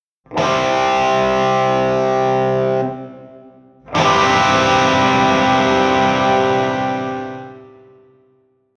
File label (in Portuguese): Powerchord C + Harmonicos E B G D